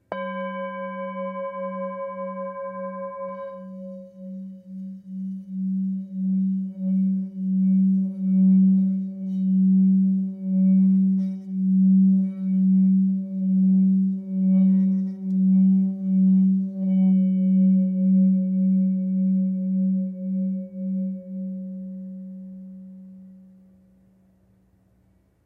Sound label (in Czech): tibetska misa; chu velka